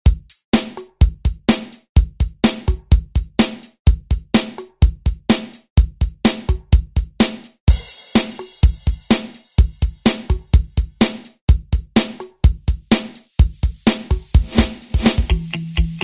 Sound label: 70s ReDrum; DJ Mhark